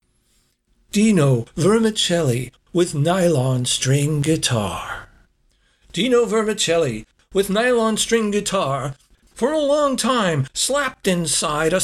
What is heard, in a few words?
nylon string guitar